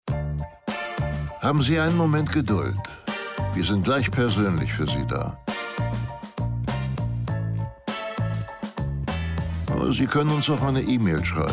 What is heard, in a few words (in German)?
Warteschleife; Systemhaus